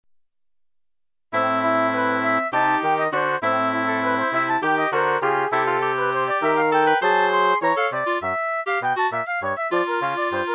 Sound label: woodwind